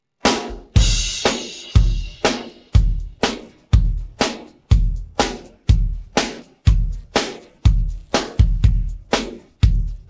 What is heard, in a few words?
pattern, drums